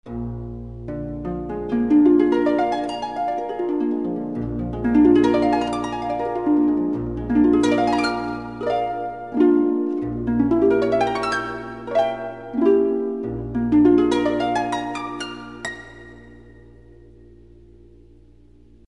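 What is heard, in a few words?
harp; Арфа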